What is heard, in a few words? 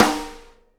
SNARE RIM, Live Snares